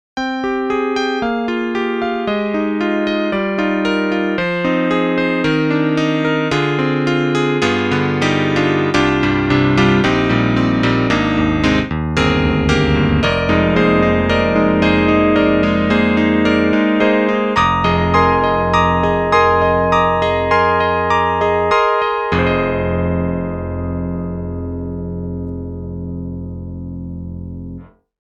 Electric Grand; EastWest; Quantum Leap Goliath

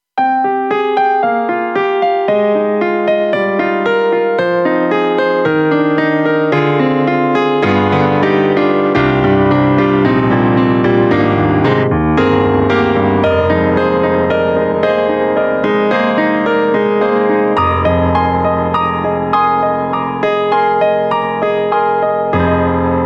KORG M3 XPanded Upright Comp Piano 2; M3 Version 1 Wave

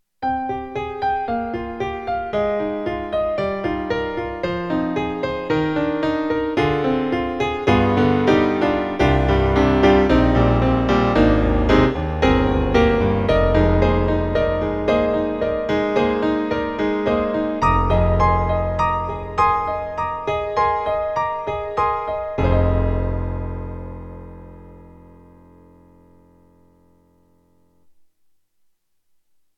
PIANO; CASIO GZ-50M

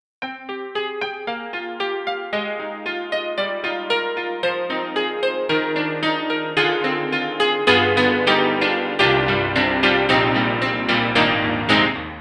Piano; KORG; KRONOS 2

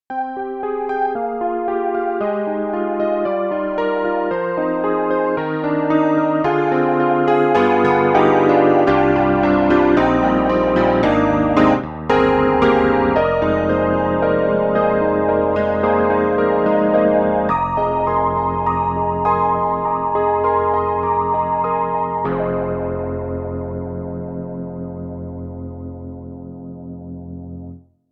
Yamaha CP80, Flanger, Psychedelic Flanger; Steinberg; The Grand 3

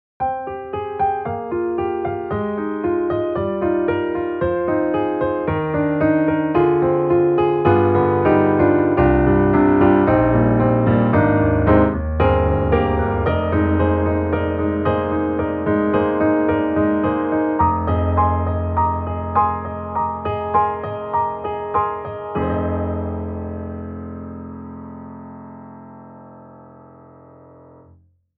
Keys Studio Grand Jazzish; XLN Audio; Addictive Keys

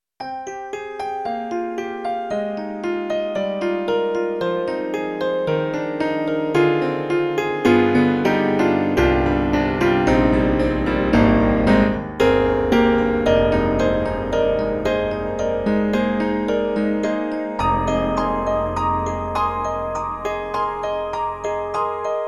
Roland SonicCell Piano

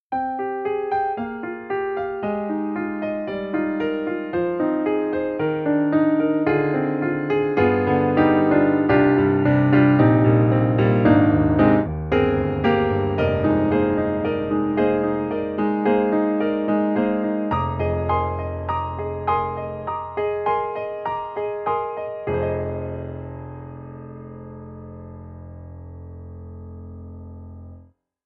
German Grand, Side Mic; VI Labs; True Keys

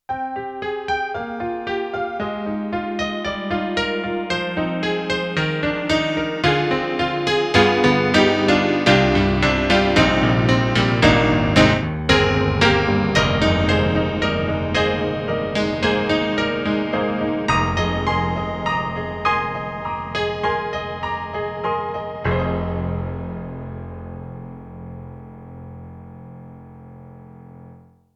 Honky-tonk; SD-80; EDIROL

